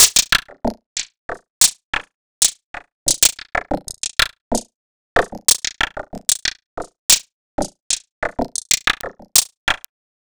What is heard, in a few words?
Laser; Sonic Foundry (Sony Creative Software) - Future Retro Dance Excursions